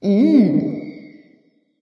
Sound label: tongue light; smite; voicepack; hel